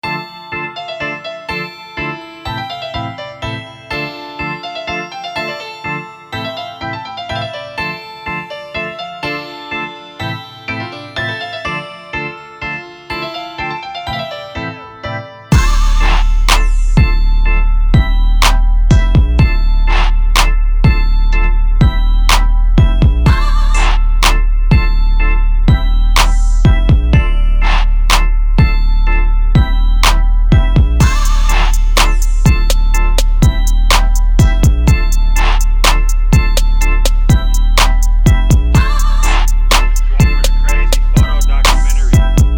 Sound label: Instrumental